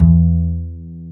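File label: double bass E2; Univers Sons; Jazzistic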